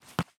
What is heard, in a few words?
Sliding Tackle; Championship Manager 2006; XBOX